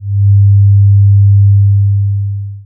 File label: Charge Load Interference